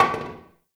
Single Wooden Impact; Warframe; Ambience; Nineteen Ninety Nine

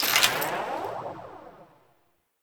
Merchant UI Close; Veilbreaker; Warframe

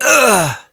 Generic Short Pain, Operator Voice

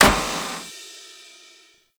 Stadium Cymbal Destroy; Warframe; Ambience; Nineteen Ninety Nine

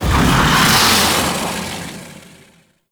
Flight Whoosh; Warframe; Teralyst; Ropalolyst

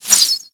Arrow Whizzby; Warframe; Bullet Impacts; Whizzbys

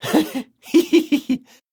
Laugh; Warframe; Alad V Boss

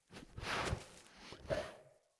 Wolf Steps Jog Stop; Animals; Foley Actions

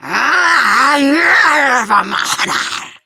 Scream; Warframe; Grineer Catbrow Handler; Battle Barks

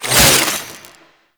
Kuva Lich Finisher Parazon Stab Out; Warframe

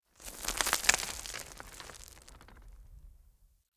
Cliff Rock Debris Small; Ambience; Midrath Island

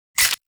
Akimbo Pistol Mech; Grineer; Weapons

